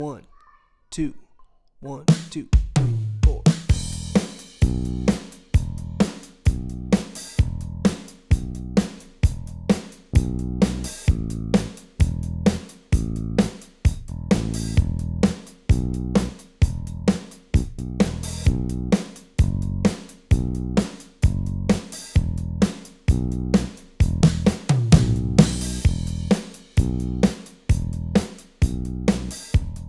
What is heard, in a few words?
easy country guitar solo; backing tracks